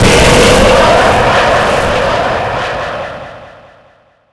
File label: portal, ambience